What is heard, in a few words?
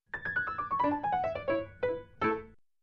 Elegant and Happy Piano Scale Down